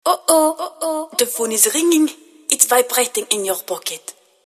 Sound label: Vibrating in your pocket